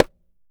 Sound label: CLAY FRAM, CAMEROON; AKAI S6000 CD-ROM - WorldSounds; CAMEROON PRC